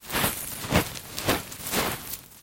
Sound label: digging hand